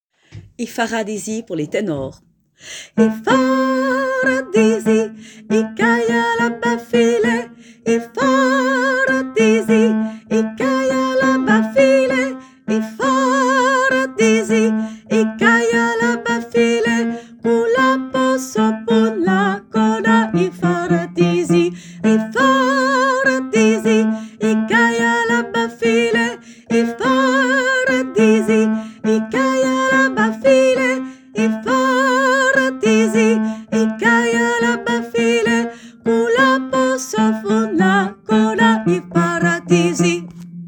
tenors; Ipharadisi

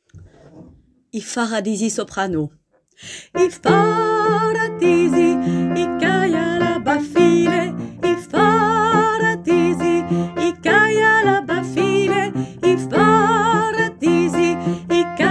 Ipharadisi Soprani

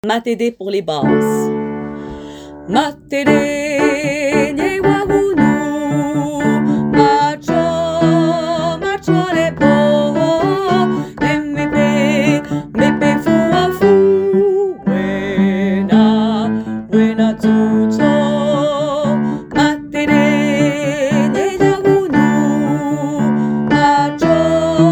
Matede Basses

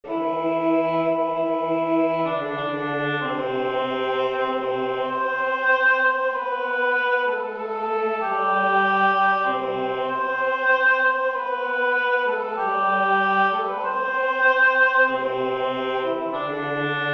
Santo alti

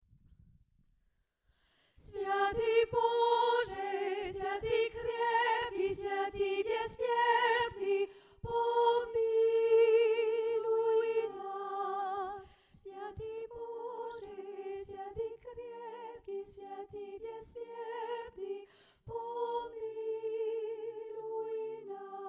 sopranos; Svyiatyi Boje

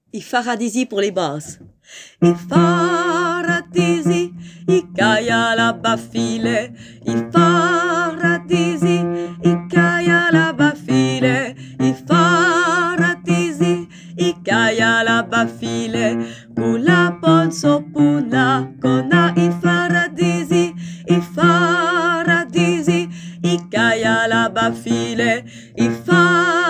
Ipharadisi Basses

